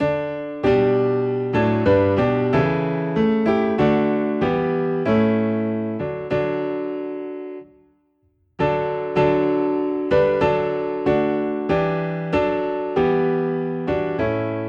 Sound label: Piano in D; We Gather Together